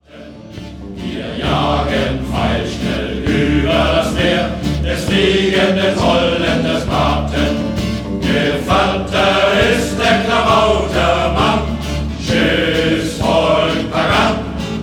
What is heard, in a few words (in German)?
Shanty Chor